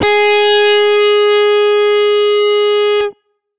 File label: guitar electronic, distortion guitar